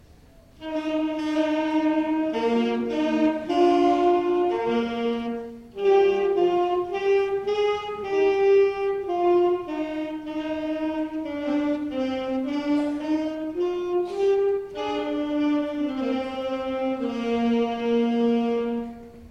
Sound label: Saxes